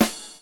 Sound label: RIDE, RIDING; AMG Gota Yashiki Groove Activator